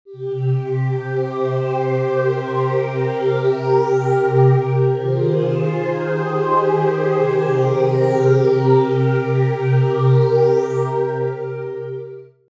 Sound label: Hollywood Pad